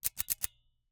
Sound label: Ice cream Scoop, metal, mechanical, teeth, gear, squeeze, release, double; Eiravaein Works; Vaeyan IV